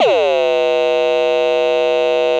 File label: kick